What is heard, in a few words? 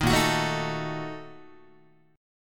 Bb Major 7th Suspended 4th Sharp 5th, Guitar; strummed; JGuitar